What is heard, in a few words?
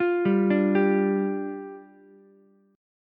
D Minor Double Flat 5th, down, Ukulele; Dmbb5; arpeggiated; JGuitar